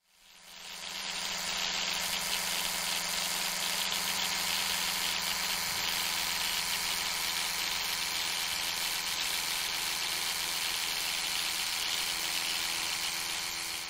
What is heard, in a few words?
electric toothbrush; مسواک برقی